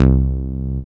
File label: SYN SEQ-STEP, dance synth; Sound & Vision - Gigapack I CD 2 (Roland)